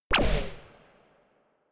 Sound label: space laser gun bubble shot forceful; krieg-waffen; soundpool